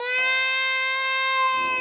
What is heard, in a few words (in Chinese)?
哭声; 黄金船; 赛马娘